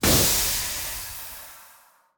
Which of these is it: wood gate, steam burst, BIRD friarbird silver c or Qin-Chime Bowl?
steam burst